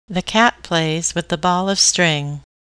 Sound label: string